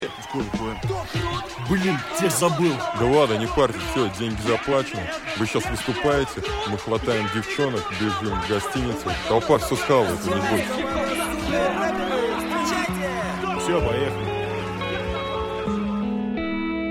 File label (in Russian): (интерлюдия)